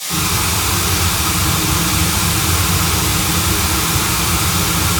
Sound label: Independent Pink Noise 3d